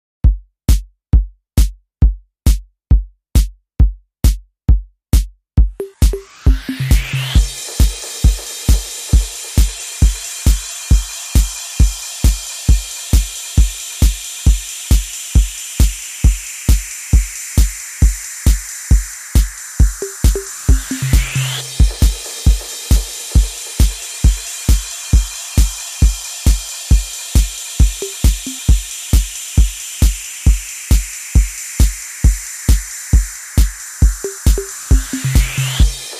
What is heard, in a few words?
Serious Alert Beat